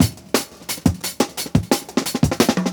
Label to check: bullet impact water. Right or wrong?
Wrong